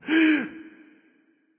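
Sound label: nonverbal breath; smite; voicepack; ullr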